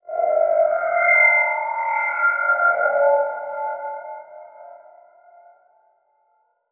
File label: Transition Musical Tone Warble; Production Element; Title Transition; AdobeCC